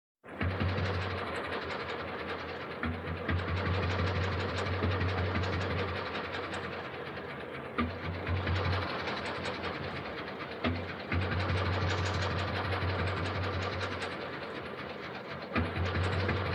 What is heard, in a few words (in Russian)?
Gazovyi nasos